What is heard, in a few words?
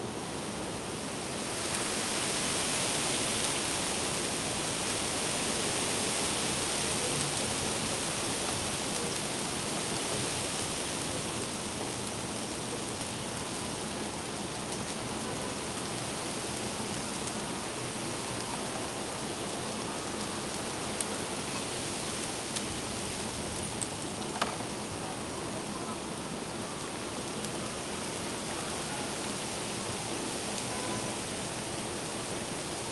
weather wind city street wind in trees strong foliage rustle gust wash subtle creaks noise